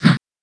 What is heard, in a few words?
pain; Alien Arena; firebrand